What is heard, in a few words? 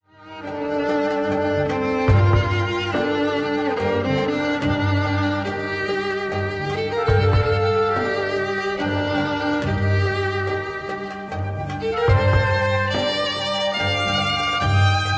Bolero for Violin and Orchestra